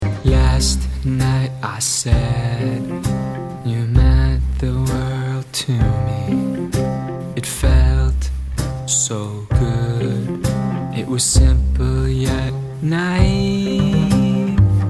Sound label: verse 1 pre and chorus